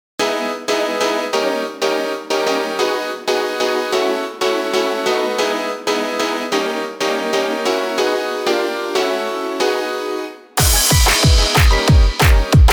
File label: off vocal